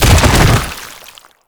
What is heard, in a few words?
Proto Infested Bodyfall Default; Enemies; Warframe